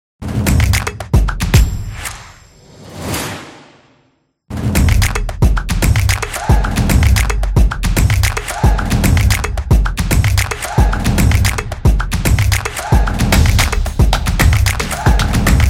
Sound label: Drums Claps Percussion; percussive nation vol 7